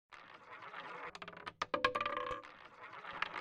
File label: rolling